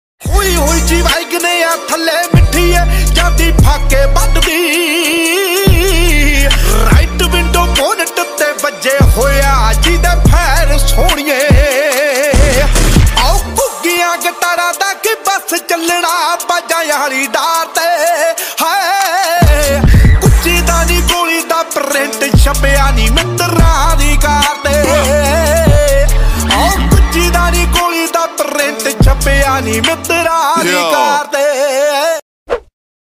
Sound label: Punjabi ringtone